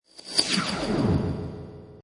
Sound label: Scifi Corridor Sweep; Scifi Production Element - Corridor Sweep. Suitable as science fiction film and game production; Science Fiction Sound Effects; Lynne Music